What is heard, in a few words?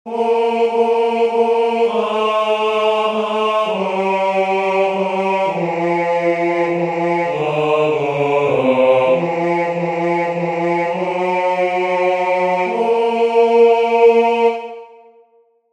Bass